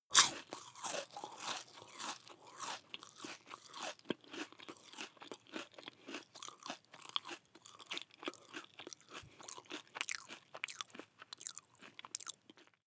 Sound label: chew biscuit smack one piece